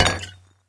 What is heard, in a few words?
item bottle drop